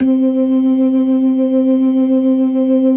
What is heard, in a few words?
LO MANUAL VIB, Organ